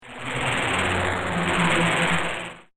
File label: Just A Dirty Flyby, whoosh sound effect; Whoosh Sound Effects